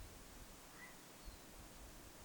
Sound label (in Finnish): harmaahaikaran aani